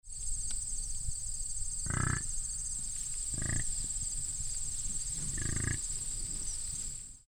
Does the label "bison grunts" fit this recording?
Yes